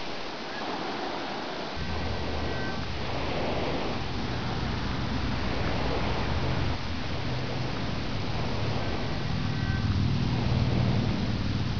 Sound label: environ, ambience